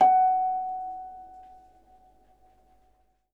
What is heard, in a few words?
H F#5, Percussion & Harp; Miroslav Vitous